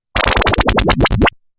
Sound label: Lazer Stuff; Soundzabound